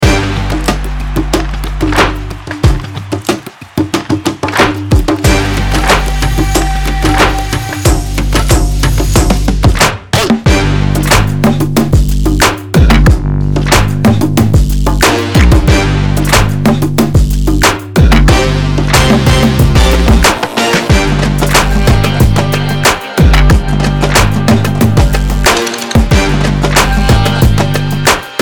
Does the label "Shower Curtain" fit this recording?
No